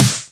Snares; Vengeance - Essential Clubsounds Vol.4

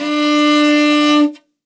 L, morin khuur, World Instruments; Best Service ProSamples vol.52 - World Instruments 2; AKAI

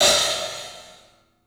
club hat; 3D Sound; 3D Drums

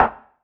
perc organic knock

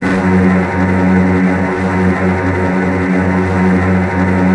Virtuoso Strings, TRM FX; ILIO; Kirk Hunter Virtuoso Strings VOL-2; 90 s Sample CDs; 10CL TRM FX

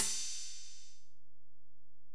Ride, Alesis DM-PRO, Drum Machines

